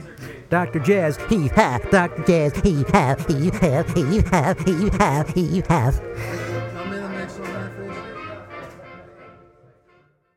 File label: JAZZ